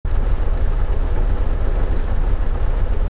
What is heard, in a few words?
Walk; starbites